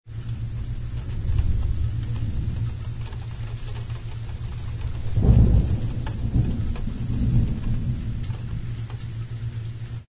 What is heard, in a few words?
T-STORM